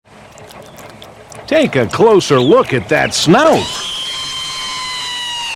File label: reee